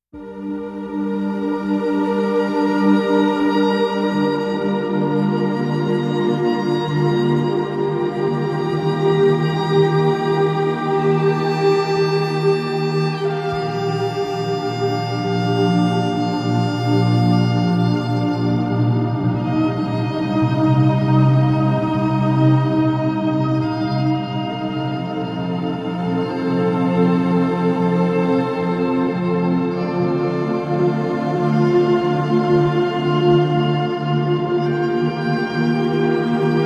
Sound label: 528 Hz Mindfulness